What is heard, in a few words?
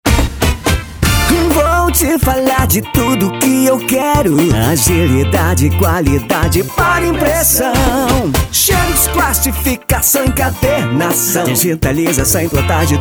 JINGLE